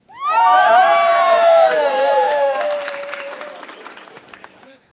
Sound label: cheering crowd